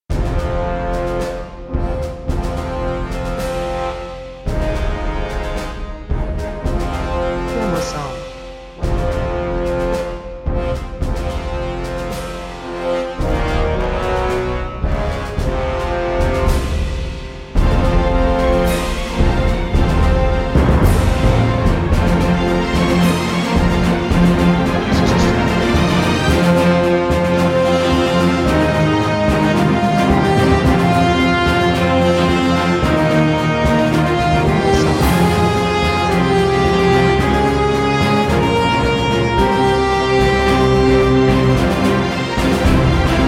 Rejoice in Triumph

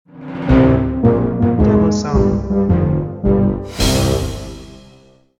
Hey Ho Oompa Boompa, Tuba

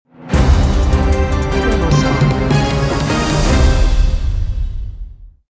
Thrilling Action Stinger

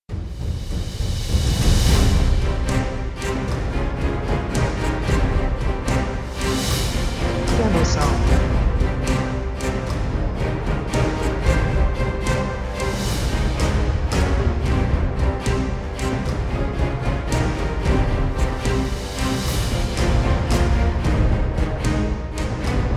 Instrumental

